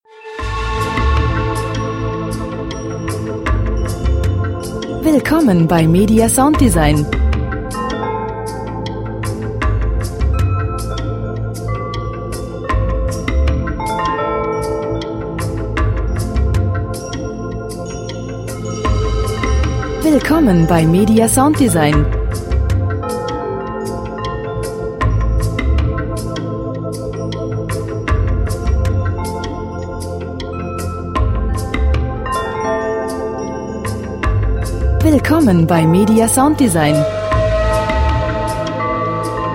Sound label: percussion